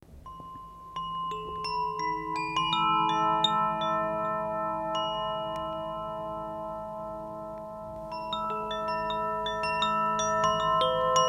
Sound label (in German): klangspiel koshi aria